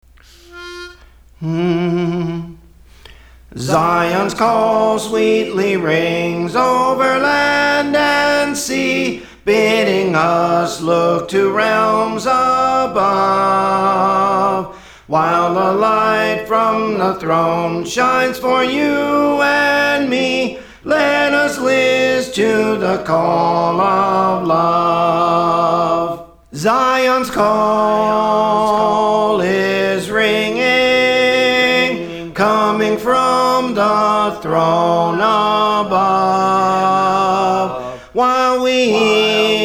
Soprano; Praise For The Lord Training

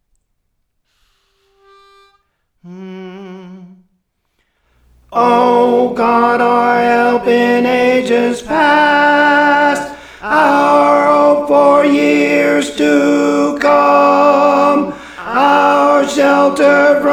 Chant Tenor, Songs; Praise For The Lord Training

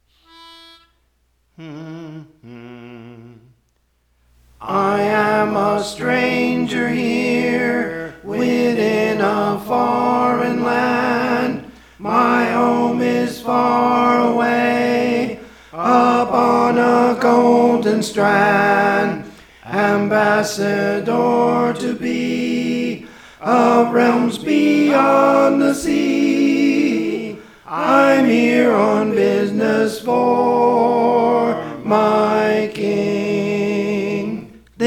Tenor; Praise For The Lord Training

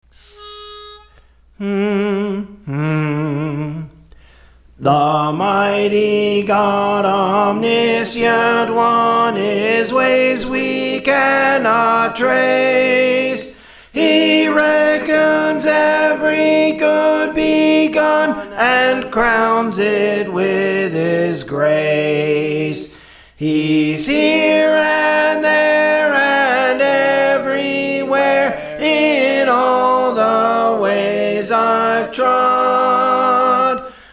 Soprano; The Providence of God; Praise For The Lord Training

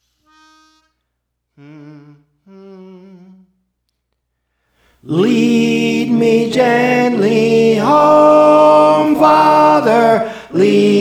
Tenor; Praise For The Lord Training; Lead Me Gently Home Father

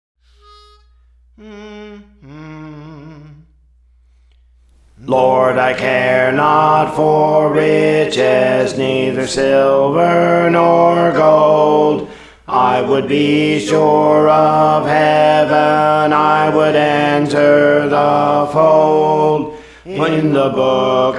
Alto, Songs; Praise For The Lord Training; Lord I Care Not for Riches

